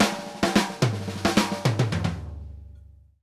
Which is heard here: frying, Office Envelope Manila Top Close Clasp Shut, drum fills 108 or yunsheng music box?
drum fills 108